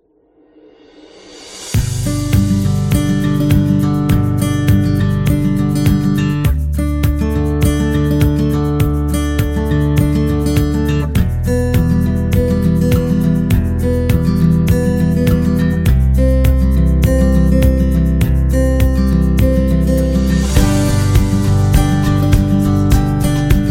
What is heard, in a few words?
Guitar Acoustic; happy music vol 4